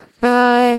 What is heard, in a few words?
singing